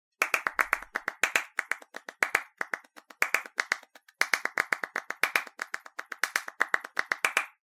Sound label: rhythm loop claps palmas 120 bpm